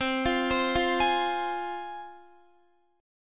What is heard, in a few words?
C Suspended 4th Sharp 5th, C, down, Guitar; Csus4#5 arpeggiated